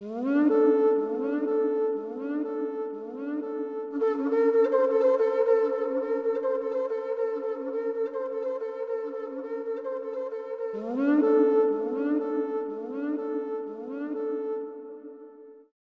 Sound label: Horn of Truth (Snow Horn); n64; Dinosaur Planet